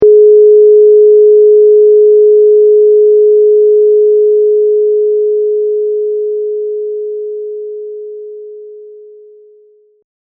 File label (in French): Diapason; Mozart; fizziq